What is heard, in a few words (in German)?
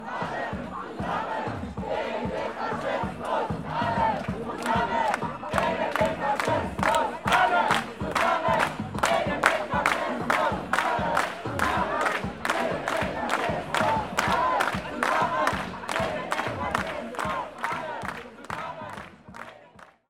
Chor